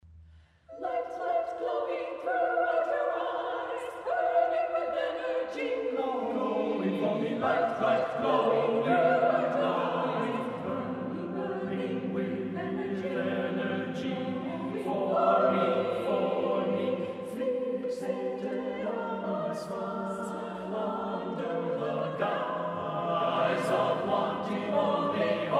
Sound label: Vocal Ensemble; Cayuga Vocal Ensemble